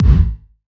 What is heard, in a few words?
Kick, Gated Reverb; musicradar